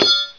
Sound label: bolt bnc